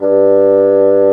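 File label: SOFT BASSOON G4; Northstar - New Gold VOL-2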